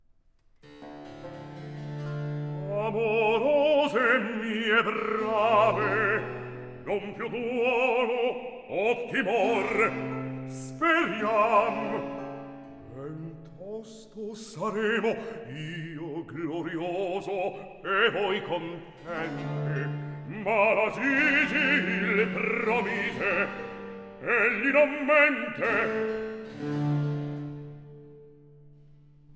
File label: Recitativo, Arie per basso; Orlando Furioso, RV Anh84; Antonio Vivaldi (1678 - 1741)